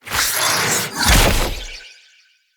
Staff Cast Heavy; Weapons; Enemy; Staves; Mnd Wazard Staff

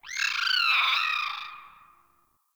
Ostron Village Birds One Off; Warframe; Eidolon Plains; Ambience

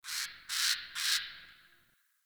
Tree Critters Happy Calm; Warframe; Duviri; Ambience

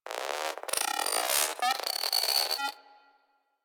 Venus Corpus Data, Ambience; Warframe; Venus Open World; Venus Landscape

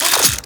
Air Pistol Unequip; Crp Air Pistol

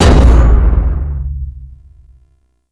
Death Orb Pound, Traps; Warframe; Orokin

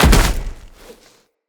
Tenno Land Heavy Snow, Footsteps; Characters

